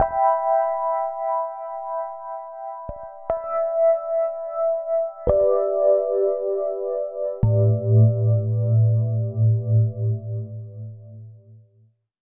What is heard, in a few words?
FM Chords, Keys; Sonic Foundry (Sony Creative Software); Slow Silhouette